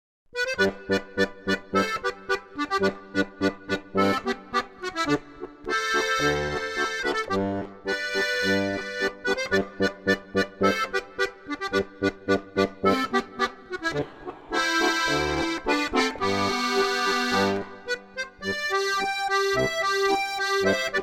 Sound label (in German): Harmonika Suite